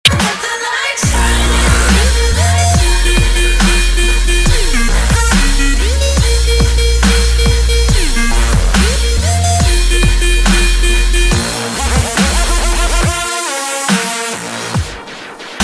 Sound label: Dubstep